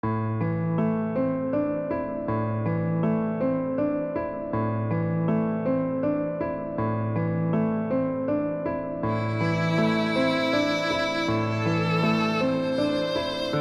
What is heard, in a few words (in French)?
Musique Menu